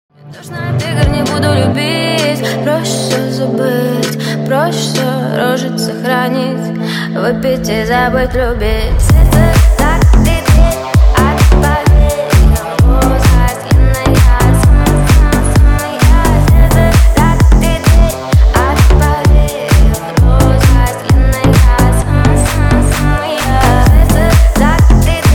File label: remix